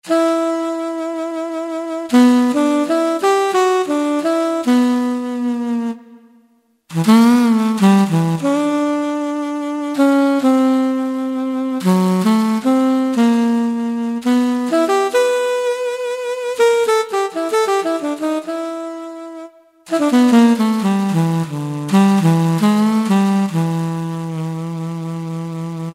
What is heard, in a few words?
Sweet Tnr; Yamaha YPT-400; Voices